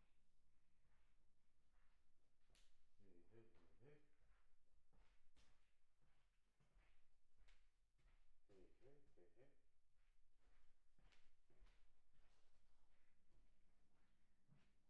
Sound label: PERC OH